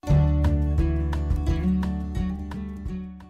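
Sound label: round dance Instrumental Two Step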